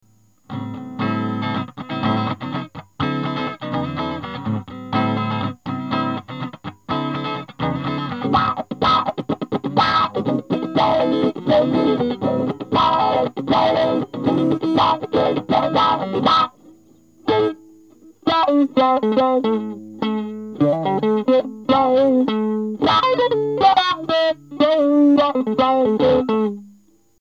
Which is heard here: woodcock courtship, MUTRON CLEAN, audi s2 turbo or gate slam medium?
MUTRON CLEAN